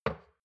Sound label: shot glass place table soft; berufe; gastronomie; soundpool